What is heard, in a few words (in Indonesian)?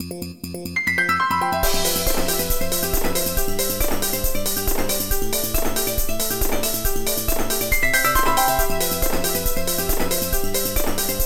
Nada Dering Nokia Jadul; Nocturnal